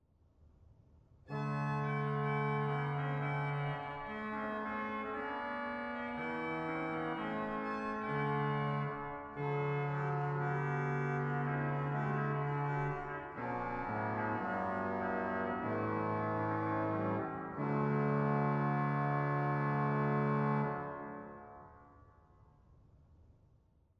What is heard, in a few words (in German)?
Cor Anglais 8; dortmund; Sauer